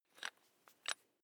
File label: electric drill bit fasten wrench turn medium